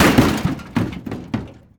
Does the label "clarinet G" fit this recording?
No